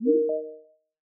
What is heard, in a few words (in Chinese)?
蜂鸣声; Teams; Classworks